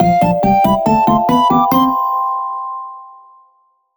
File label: Level Complete